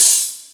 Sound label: hh heavy, Yamaha TG-500